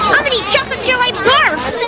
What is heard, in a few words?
barf; Simpsons; Ralph; Chocolate